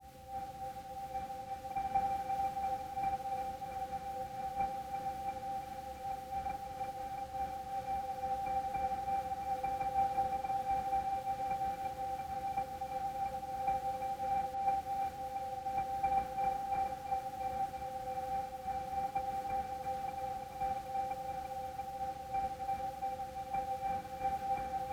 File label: 132 BPM E Min Pad; Melody Loops; Ambient; Endless; Cymatics; ANALOG EVOLUTION Beta; Ambient Collection